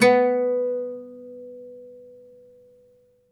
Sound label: OUD B2, Plucked Strings; E-MU Producer Series Vol. 7 – Old World Instruments